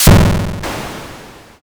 thunder magic

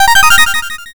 oneup

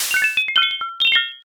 glass break

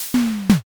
fridge

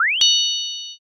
light magic

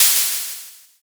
open hat

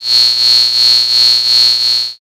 cicada

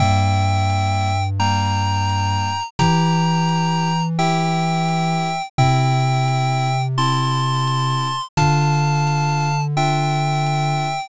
bgm mellow

